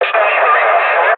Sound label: ambient battle; World Of Warships